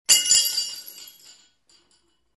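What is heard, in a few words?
sounds of table plates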